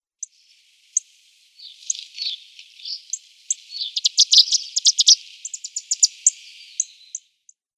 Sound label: Little Bunting calls